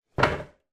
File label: household chair movement on hard floor